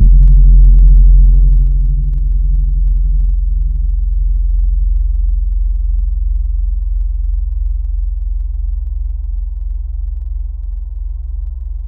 Gamelan 5ths; Synthetic's Serum Collection

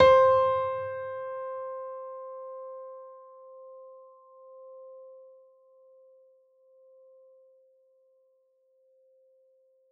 Gentle Piano C4; Synthetic's Serum Collection; Aubit; Garyx Vol. 1

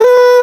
Noises Vocal Shot

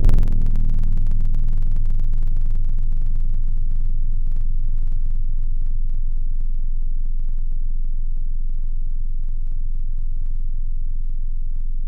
Keys Juice Bell; Synthetic's Serum Collection; ESW X Keys

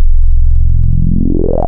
303 Acid

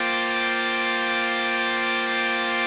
synth; Happy Tekno Track Contest SamplePack

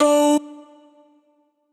Vox C2; Noises; Synthetic's Serum Collection

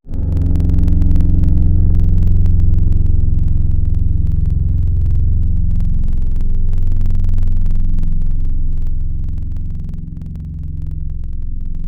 Keys Simple Rodes; Synthetic's Serum Collection; ESW X Keys

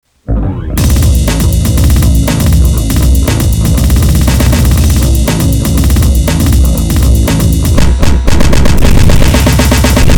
whisper

